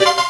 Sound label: pow up; aggress